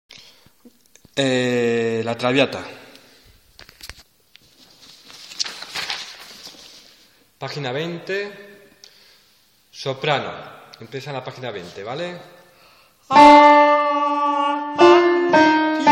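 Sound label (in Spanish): traviata soprano; Valladolid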